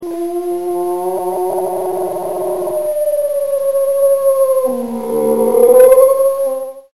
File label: moany